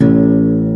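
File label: E0 MARTIN, acoustic guitar; AKAI S6000 CD-ROM - Volume 1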